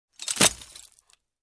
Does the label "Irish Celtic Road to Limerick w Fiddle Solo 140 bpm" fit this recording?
No